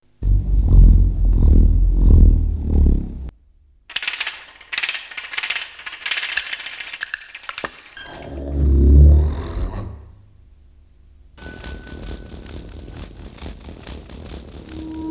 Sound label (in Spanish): Complicacion motora, atonal